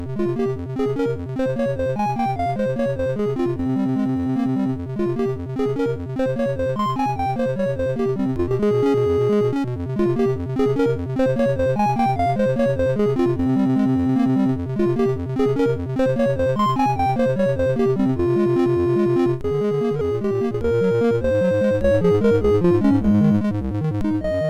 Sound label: Etude Op10 No9 F Minor, MOS6581R4